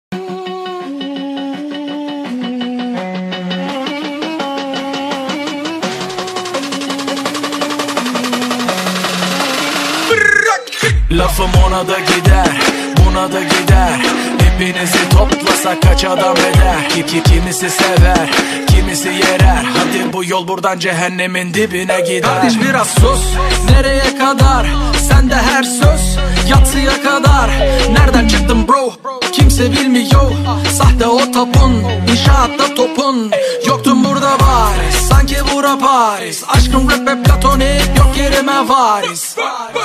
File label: rap torki